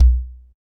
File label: fat bass drum b key